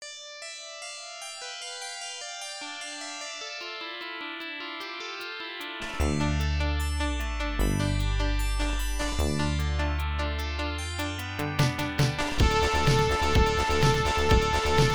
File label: metal; Impact Musix Disk 3; MSX2